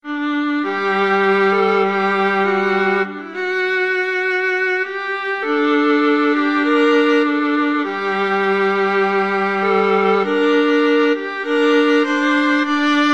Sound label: viola